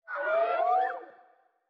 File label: Cry; Tutsu